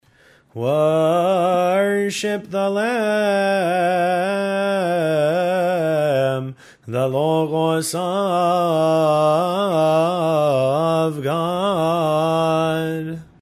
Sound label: worship the lamb the logos of god gregorian